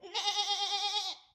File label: Sheep